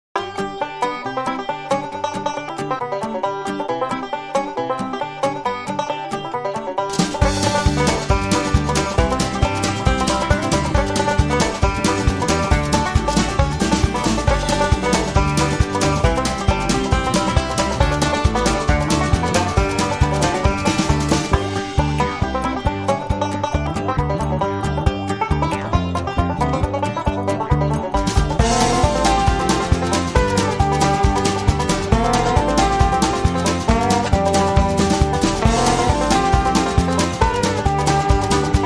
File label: Banjo